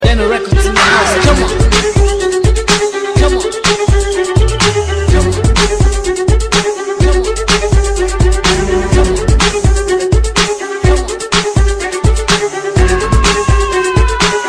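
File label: Hiphop Violin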